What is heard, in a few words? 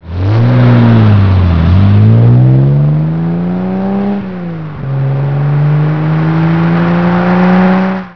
tr6; tr6 dr off